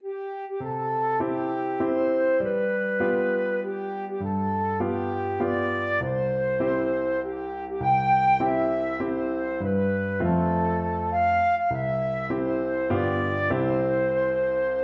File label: happy birthday, flute